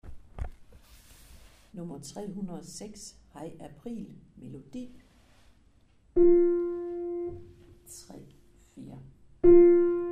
Hej april, Melodistemme

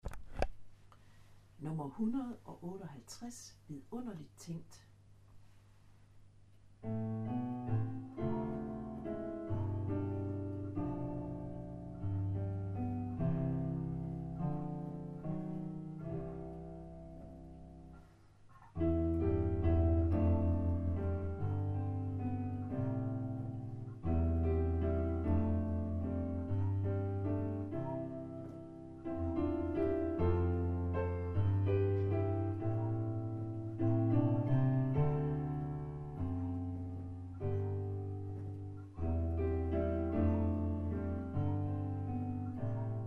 Vidunderlig tænkt, Akkompagnement

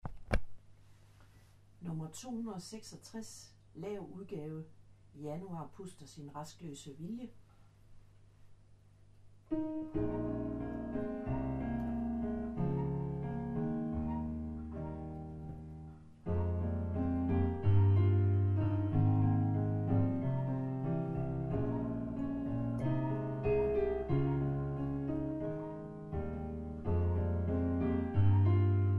Januar puster sin raskløse vilje, Lav udgave, Akkompagnement

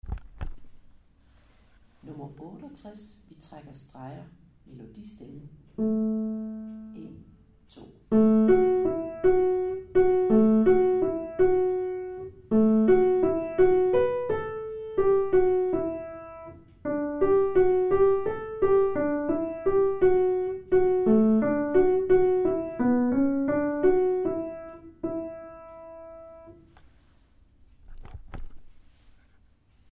Vi trækker streger, Melodistemme

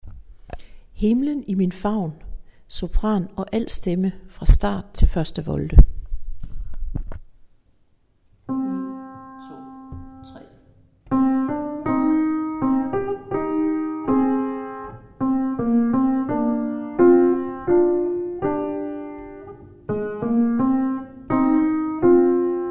Sopran og alt

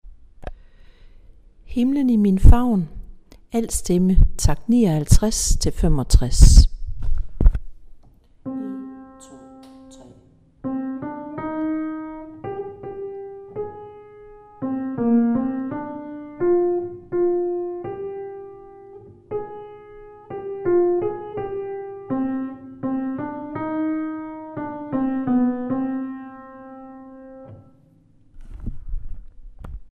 Alle stemmer

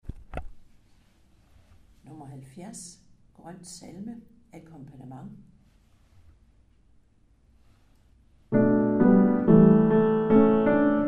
Grøn salme, Akkompagnement

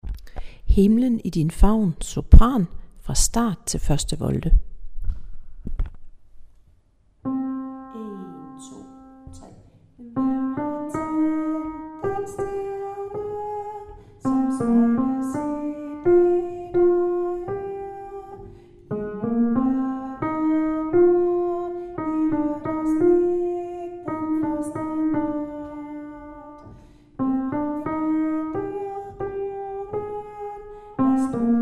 Sopran

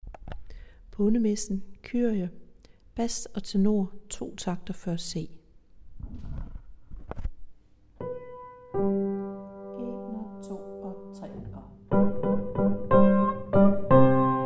Bas og Tenor; Kyrie; øvestemmer

